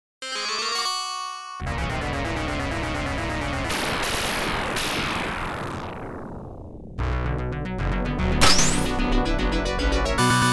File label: sword